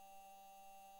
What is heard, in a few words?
mic, mars2020 edlcam